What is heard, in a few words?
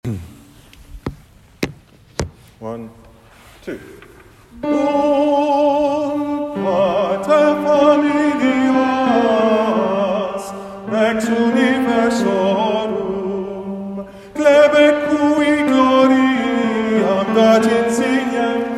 Canto ultreia tenors with words; citysings